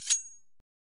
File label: lightsaber start; minecraft